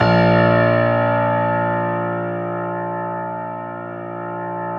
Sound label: Stereo Grand, Keyboards